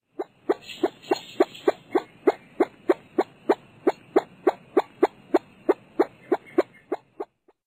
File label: ruffed grouse ohio